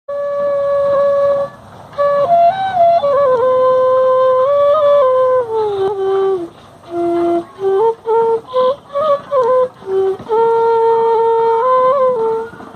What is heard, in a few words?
flute music; chhath puja